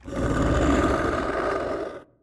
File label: screamer; sonic tralala; tralalelo